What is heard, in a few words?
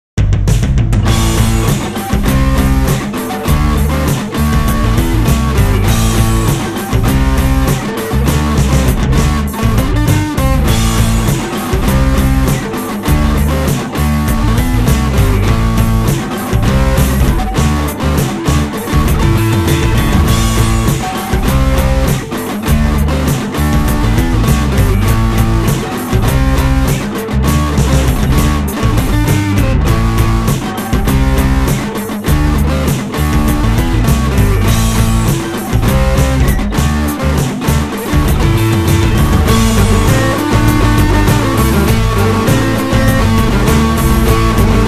rrrock heavy solo